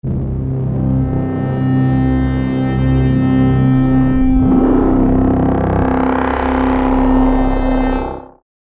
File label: Essential Scoring Elements STR; Gravity 2 Menu XL